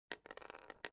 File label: casing default; bullet impacts; Soldier Of Fortune II Double Helix; XBOX